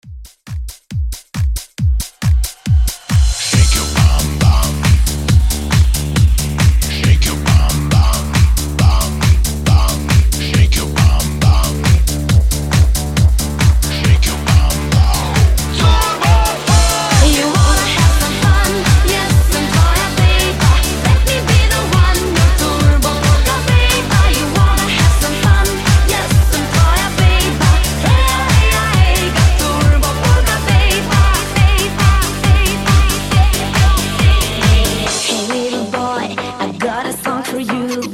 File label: Turbo Polka